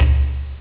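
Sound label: conga, loop, percussion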